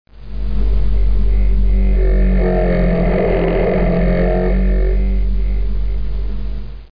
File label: Sound Breaker Zoing